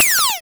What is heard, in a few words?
laser; Kenney's Sound Pack; Retro Sounds 2